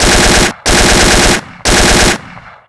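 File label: tommy gun; thomy gun; Mafie 1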